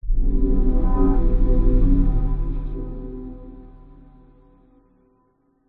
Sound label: sound design cinematic swell hit dark discordant; zapsplat